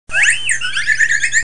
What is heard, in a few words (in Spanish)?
Grito de Floette